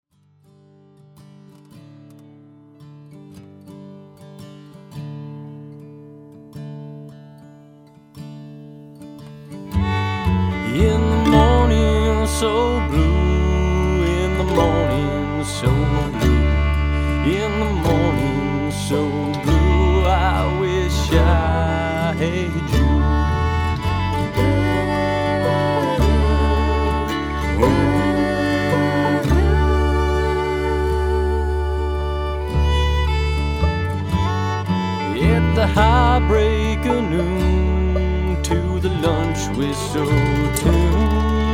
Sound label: String Band